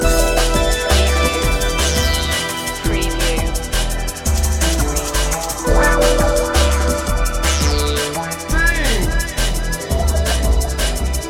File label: stimulation loop